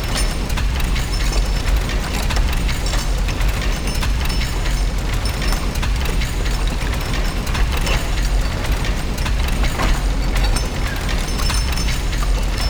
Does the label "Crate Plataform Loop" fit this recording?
Yes